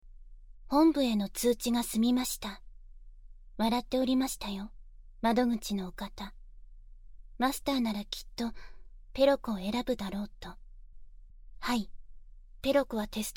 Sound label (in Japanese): 愛情フェラチオフルコース; ペロ子